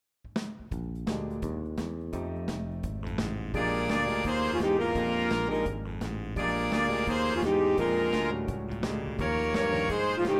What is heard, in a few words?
For Big Band